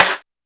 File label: Smak Snare; EMU Planet-Phatt